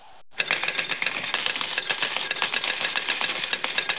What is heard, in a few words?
Coin win